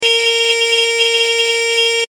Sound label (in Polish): ton